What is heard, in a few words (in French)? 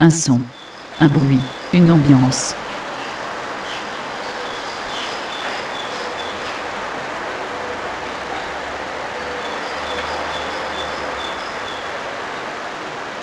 Un son un bruit une ambiance